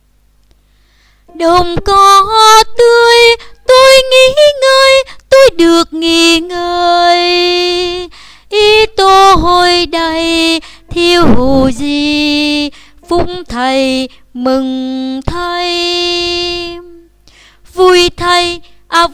basso

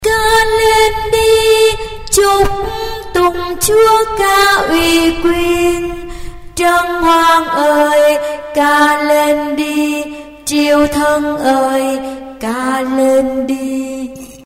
basso

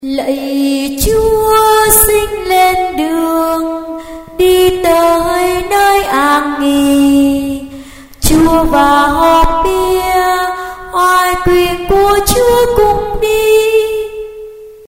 tenor basso

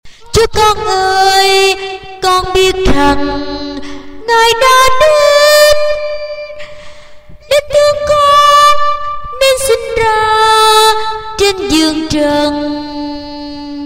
tenor basso

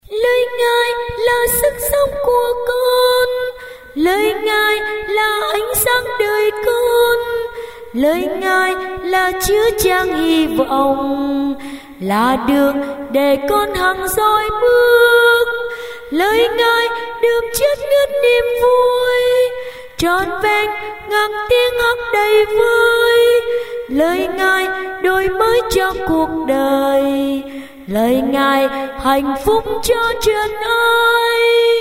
tenor

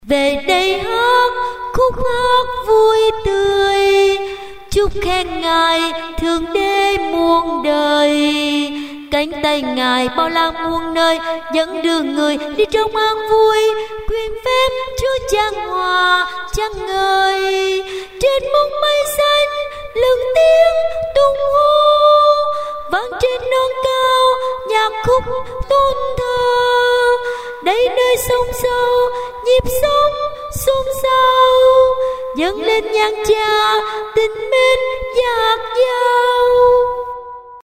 tenor basso

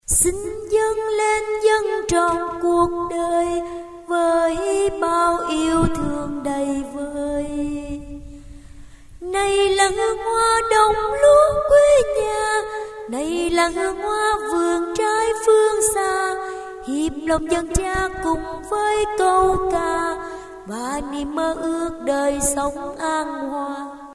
sop alto

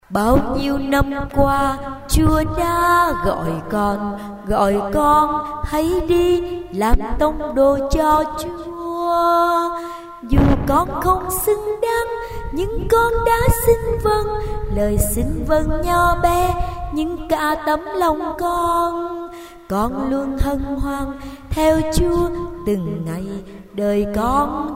sop alto

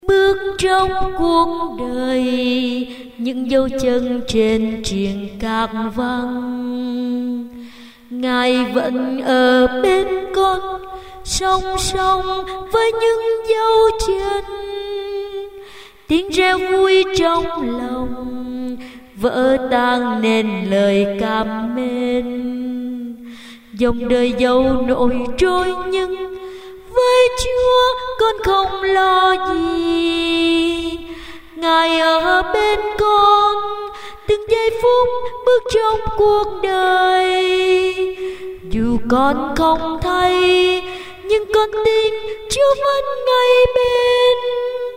tenor basso

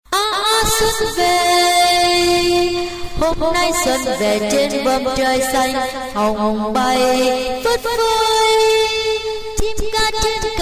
basso

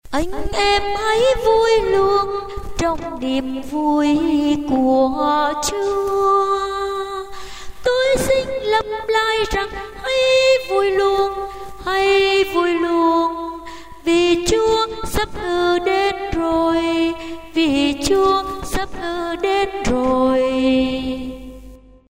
basso

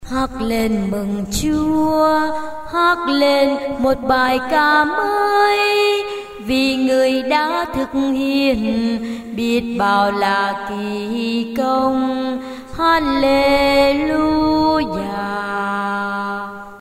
basso

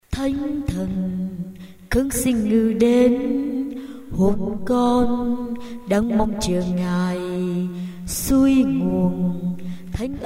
sop alto

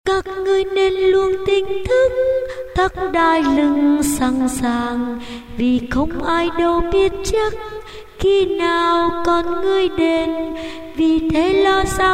tenor basso

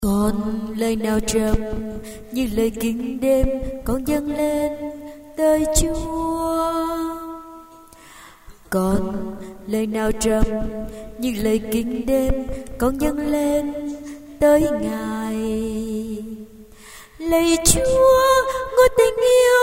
tenor basso

